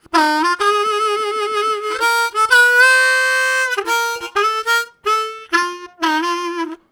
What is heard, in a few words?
Low Down, Harmonica; Zero G